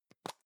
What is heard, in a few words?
plastic can lid screw open soft; objekte; dose